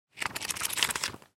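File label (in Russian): быстрое просматривание глянца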